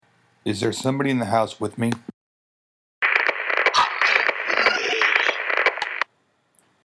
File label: EVP